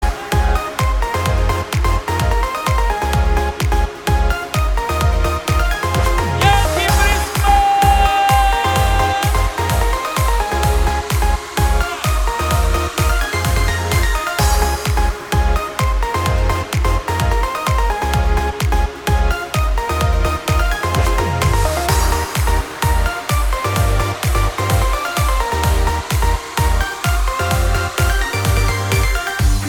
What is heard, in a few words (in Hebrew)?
מוזיקה צלצול